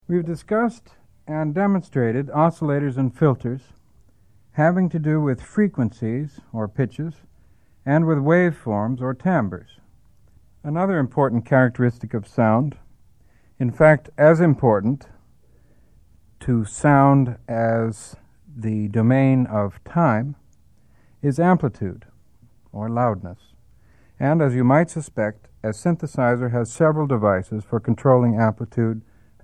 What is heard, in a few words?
Amplitude Control